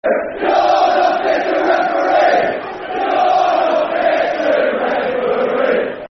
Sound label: You're Not Fit To Referee, Football Chant (Soccer Songs); Swindon Town Stfc